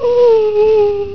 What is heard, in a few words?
CRY SMALL; PEOPLE